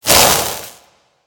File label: projectile; smite; god raijin